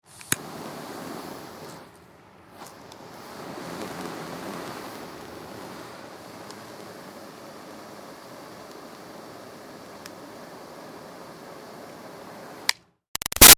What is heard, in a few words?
Lighter Butane Torch Small On Wave Steady Off; Flames; Objects; Big Room Sound